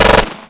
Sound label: Uzi Pistol auto; SpecOps2 MPlayer Demo